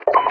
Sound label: mic click on; teamspeak; radio sounds; cod4mw